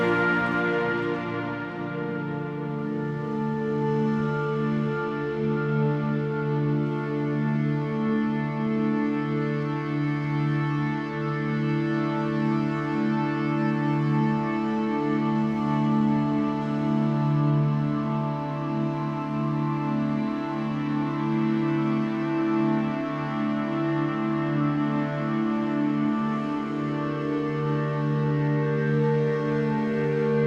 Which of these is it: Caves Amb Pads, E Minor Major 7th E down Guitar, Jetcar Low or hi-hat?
Caves Amb Pads